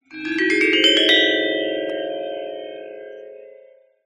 musical instrument gender small gamelan glissando upward